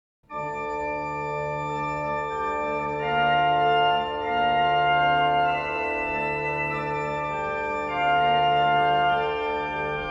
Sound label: Chorale Preludes; Scott; Three Chorale Preludes 3